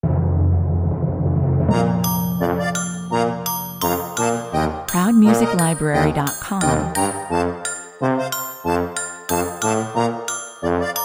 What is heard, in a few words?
Amusing, Irreverent, Silly